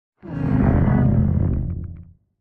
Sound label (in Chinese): 监守者环境声